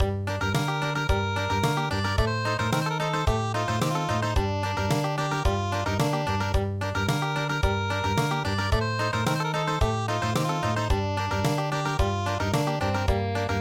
Country, midi

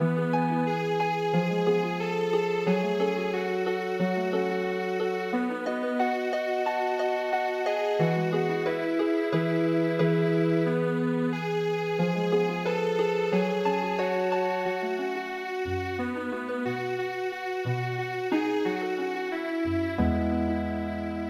Hush Little Baby Healing Harp

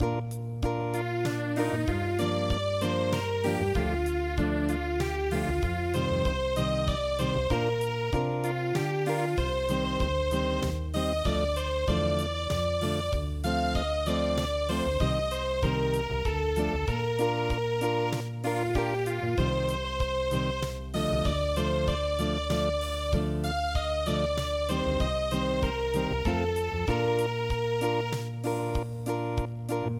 Schon ist die Jugend Unplugged

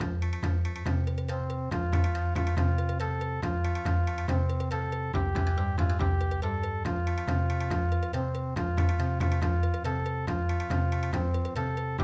Bon Odori; Happy Camp

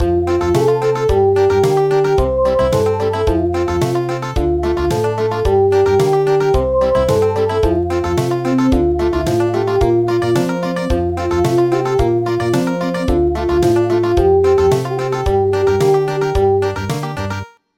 Country; Pots Pots

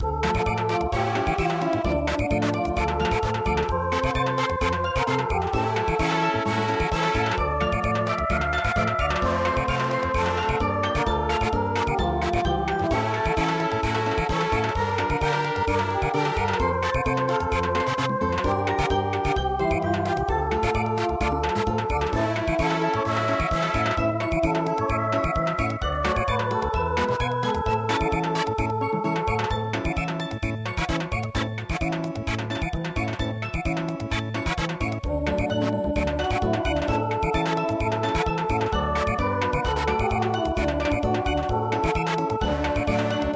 Samba

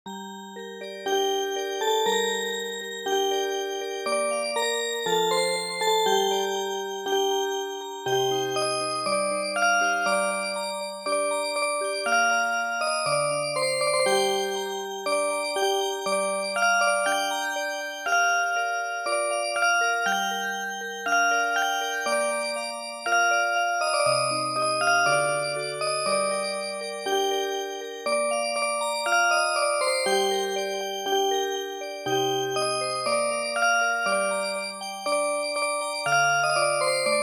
Music Box

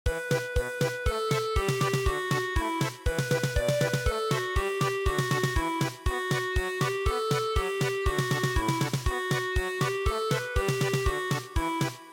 German Polka

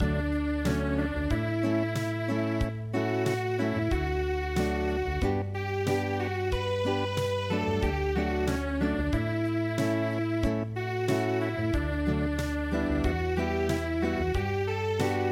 Unplugged

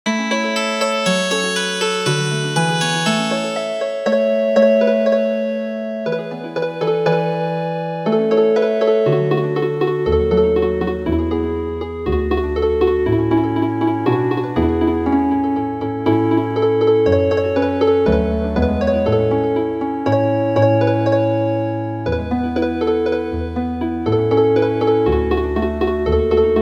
Healin Harp

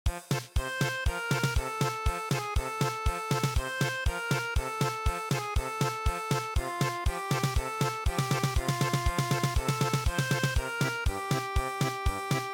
German Polka

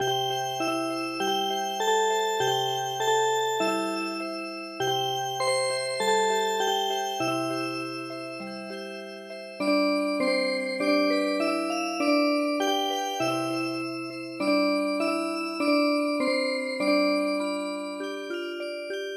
Music Box, traditional; Secton Chogori

